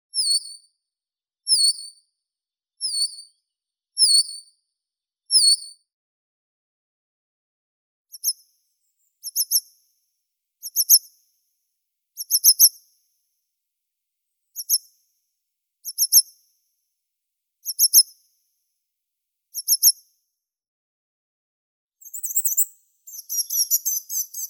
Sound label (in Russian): moskovka periparus ater